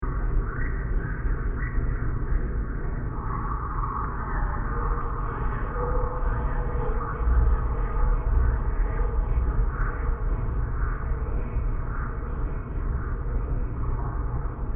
Deep Ambience With Scary Alien Noises 120bpm; Scary Soundscapes; CraftedSignals

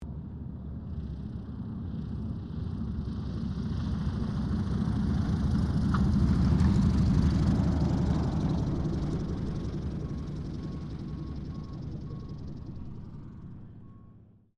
AIRCRAFT T-28D TROJAN LAND; Airplanes; Sound Effects:Vehicles

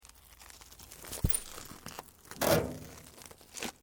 GLASS LAMINATED TWISTING; Material components for constructing more complex sounds; Glass (Sound Effects:Materials)

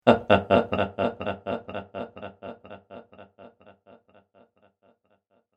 Sinister Laughter Echoes, evil laugh; horror

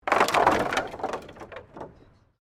Wood planks debris; Materials

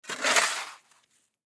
Snow Shovel Slush; Ice; Weather; Ice Sound Effects; Blastwave

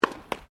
TENNIS RACKET HIT NET, Local tennis club; Sports

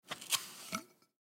Trumpet Remove Mute; Brass; Blastwave

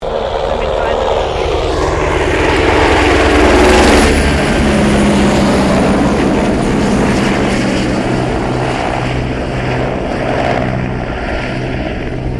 HELICOPTER AS-350BA SQUIRREL PAIR PASS; RAN Squirrel Helicopter; Helicopters (Sound Effects:Vehicles)

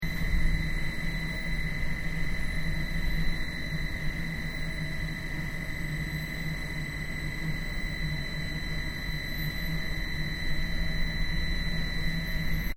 Industrial Freezer Compressor Loop; Machinery Sound Effects; Various industrial machines; Machinery (Sound Effects:Machines)

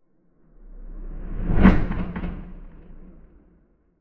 Fast Misc Transition; Scary Soundscape; Horror; Crafted Signals

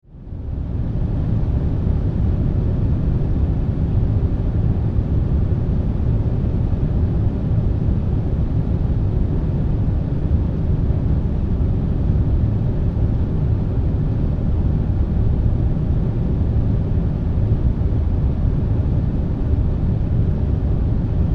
Industry Factory Air Conditioning Unit Large; Factories

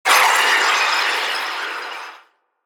Slime Bugs Attack, monster; Horror Sound Effects; Fantasy Sound Effect

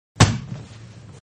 Boxing-Punch-Hitting Flesh; Sports; Manhattan Production Music

